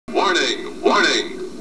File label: Warning